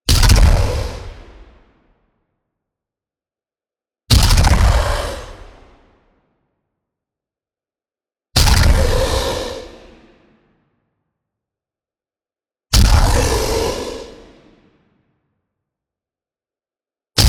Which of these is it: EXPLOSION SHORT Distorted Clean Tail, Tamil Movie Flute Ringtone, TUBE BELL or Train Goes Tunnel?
EXPLOSION SHORT Distorted Clean Tail